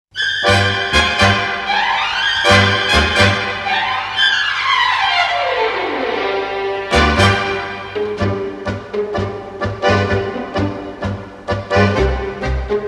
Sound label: tango